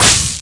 ElectricShock; Doctor Bone Cookie

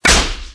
Smash; Orange; cookie run

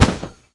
Jump Wing Flap; PDragon

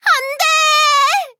hit; cookie run

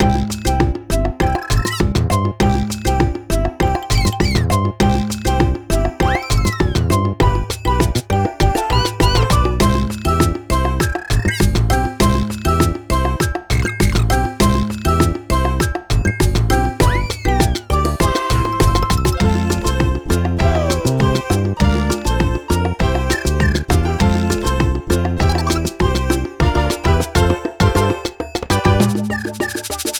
Bgm lobby; land02

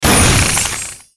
Magic; Cinnamon; Cookie Run

